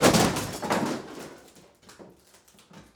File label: wood debris crash